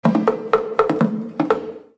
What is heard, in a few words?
Sticks Fill